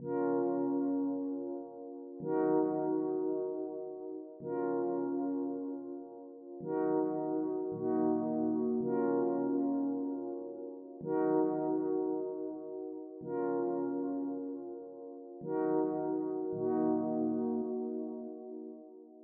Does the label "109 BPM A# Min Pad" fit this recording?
Yes